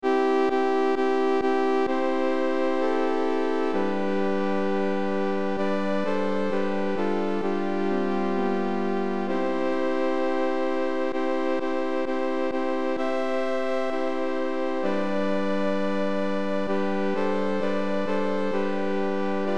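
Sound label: Great Litany, female; Velikaya ekteniya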